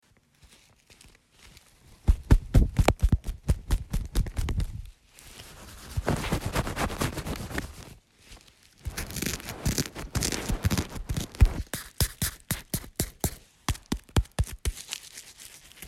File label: feather